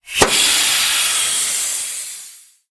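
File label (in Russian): выпуск воздуха из баллона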